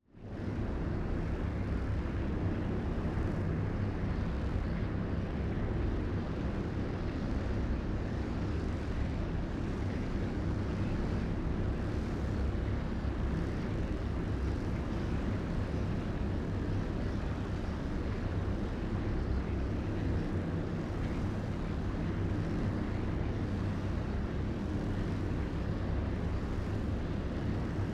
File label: AMBIENCE Antimatter Cloud; boom library